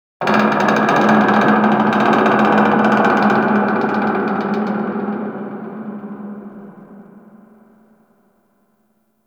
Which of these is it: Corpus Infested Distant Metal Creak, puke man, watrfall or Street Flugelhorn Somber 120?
Corpus Infested Distant Metal Creak